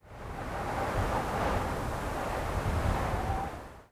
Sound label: wind gust; Counter Strike Model Pack; CS:GO